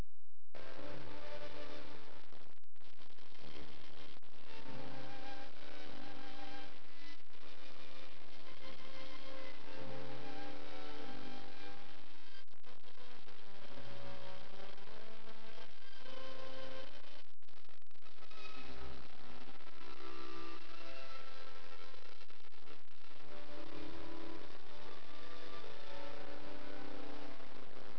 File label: VIOLIN